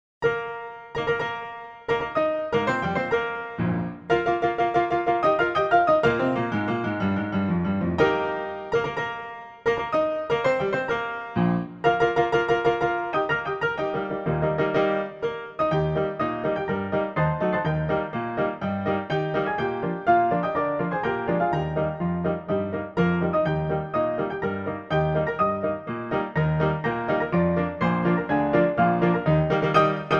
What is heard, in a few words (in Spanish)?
himno de Cuba deprisa piano